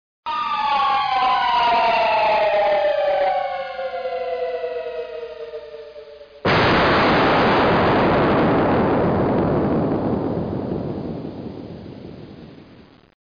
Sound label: bomb